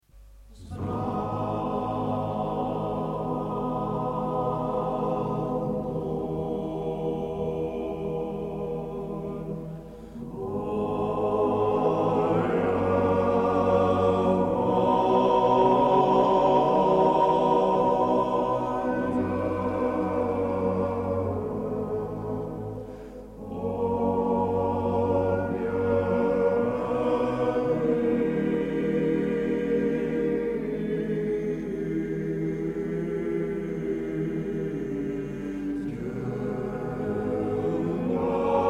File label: hymn